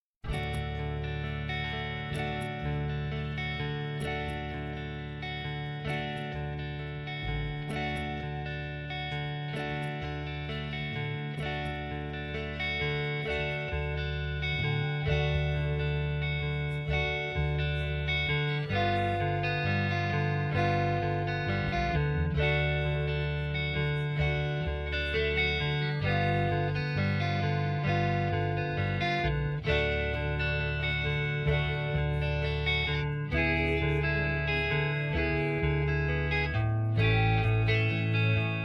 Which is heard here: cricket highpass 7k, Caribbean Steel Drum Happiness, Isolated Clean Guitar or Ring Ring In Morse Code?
Isolated Clean Guitar